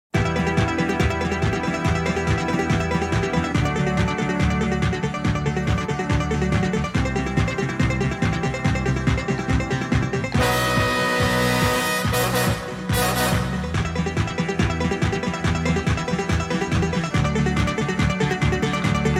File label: Title Music Instrumental; aandhi toofan 1985